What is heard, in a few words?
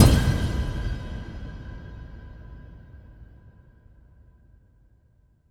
METALICOUS, Impacts, Hits & Booms; Distored Reality